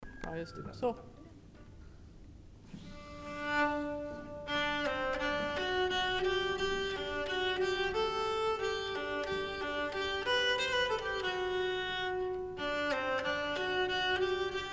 stämma till vevlirepolska

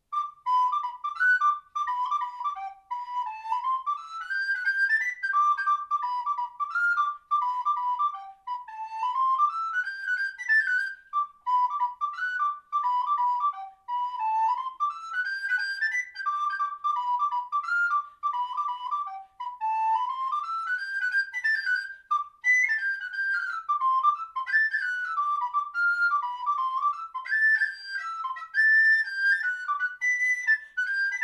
Barnprogramschottis

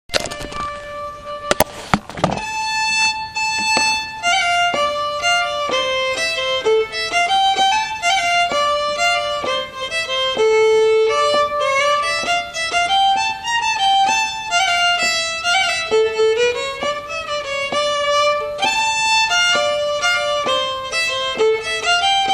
gammal Rheinländer

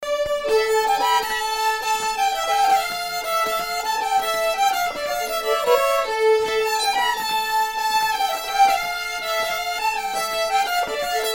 Polska från Ekshärad; Per Lööf

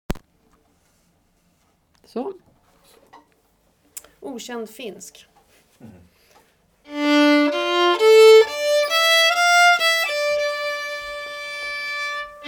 Okänd finsk vals

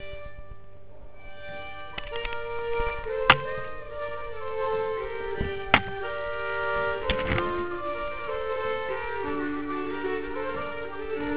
polska fr gotland

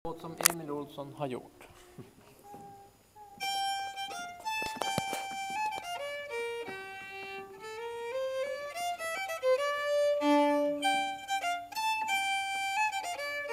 Polska

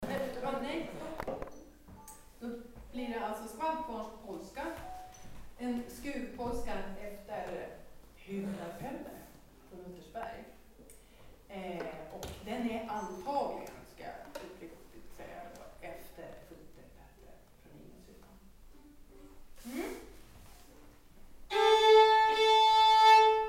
Skuvpolska

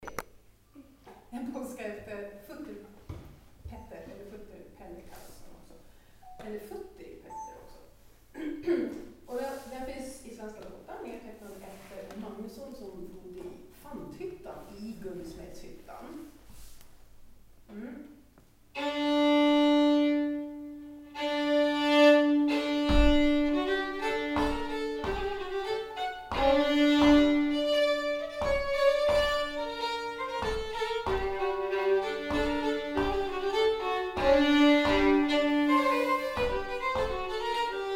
Polska

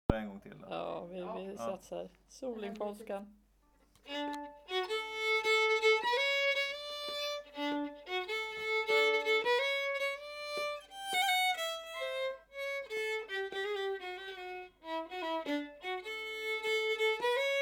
Solingpolska

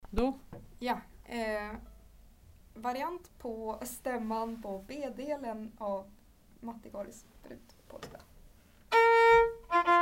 variant bdelen

